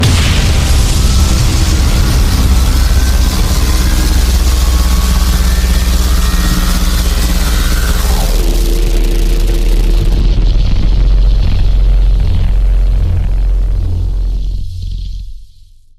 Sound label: laser beam; overseer